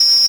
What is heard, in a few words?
Organ Music; SNES; Troy Aikman NFL Football